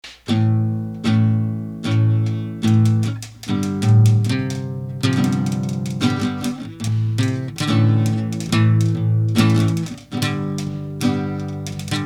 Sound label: guitar drums